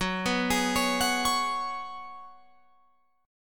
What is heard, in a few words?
Gb Suspended 2nd Suspended 4th, Guitar, Open F; Gbsus2sus4 arpeggiated; 18,18,20,20,21,20